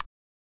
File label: Click Tick